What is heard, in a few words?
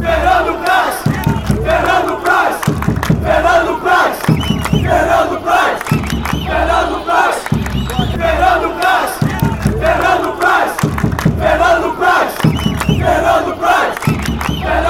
vasco da gama chant, fanchants